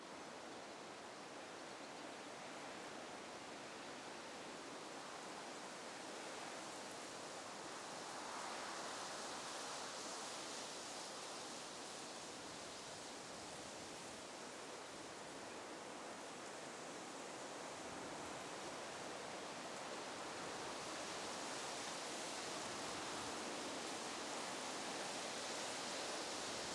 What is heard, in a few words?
Fields Soundscape